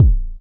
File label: studio kickdrum sound a sharp key, Royality free kickdrum sound tuned to the A# note; kick drum samples